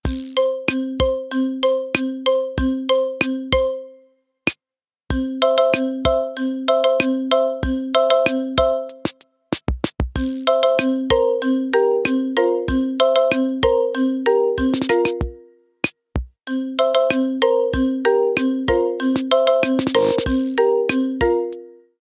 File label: Wake Up, ringtones; Tecno Spark Go 2023